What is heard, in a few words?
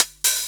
drum; 125 BPM